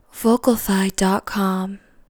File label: vocal